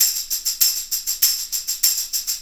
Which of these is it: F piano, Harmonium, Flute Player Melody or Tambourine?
Tambourine